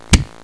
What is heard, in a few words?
THROW